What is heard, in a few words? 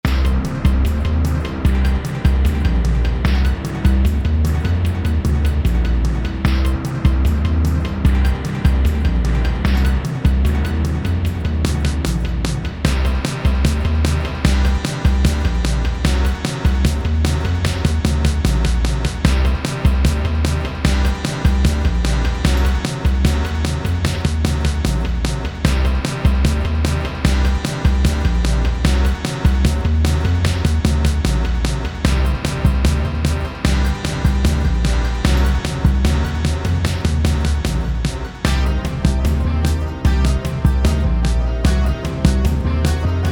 Flight 150BPM Dark Epic; Dragons Destiny OST